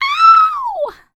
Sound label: Vocal, R&B & Dance, FEM. HUMAN; ILIO; Vocal Planet VOL-4